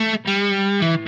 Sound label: Guitar, Indie Rock